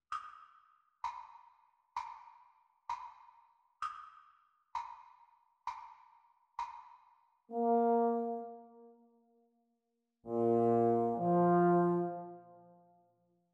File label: Playalong for Baritone Euphonium Practice Speed; euphonium and baritone duet